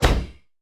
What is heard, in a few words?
NR23 Arming On End; Weapons; DCS